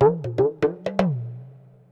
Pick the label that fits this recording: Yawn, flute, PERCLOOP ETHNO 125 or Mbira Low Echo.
PERCLOOP ETHNO 125